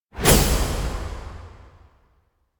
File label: elec windcorridor hole close; anemoi